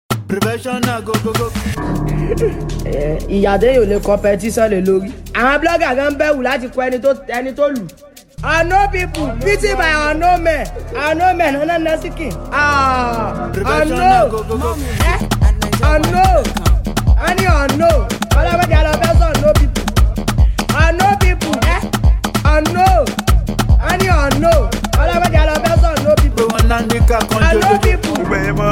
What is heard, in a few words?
Professional Beat